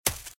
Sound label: Footsteps Leaves Sprint Left; RadwinIsland